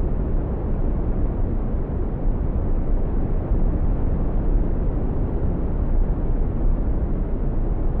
room tone; timber

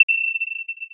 ui hover, sfx; prism

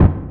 hit, sfx; timber

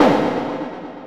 hit, sfx; anvil

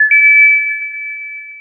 coin; prism game

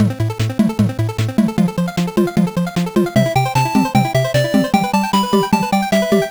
loop, music loop; arcade

